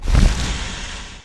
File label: wing flap; Viper